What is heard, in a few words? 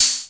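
MS-20 Open HH; Digital Sound Factory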